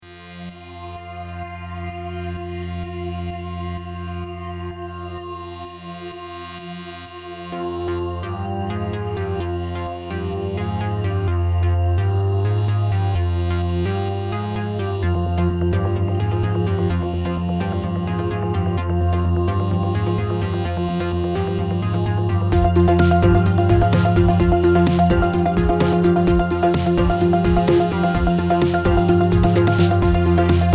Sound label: the suspense